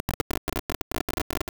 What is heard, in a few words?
static, Retro Sounds 32-Bit